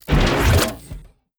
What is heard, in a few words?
door open